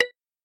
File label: reconnect; keezers